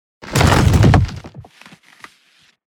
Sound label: Broken wooden objects